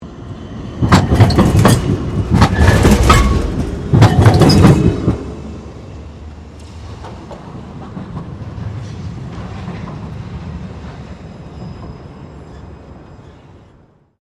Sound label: Tram Melbourne C Class Crossing Points; Various Melbourne trams; Streetcars (Sound Effects:Vehicles); SoundEffects-Foundation4